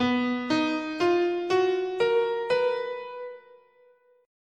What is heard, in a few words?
B Chinese